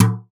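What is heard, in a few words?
TOM, drums; East West; Global Sounds; SPD11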